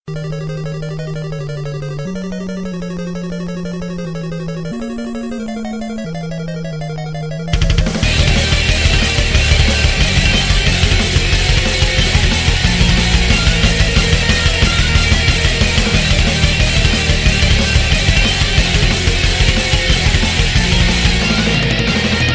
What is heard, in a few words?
Metal; Mario Castle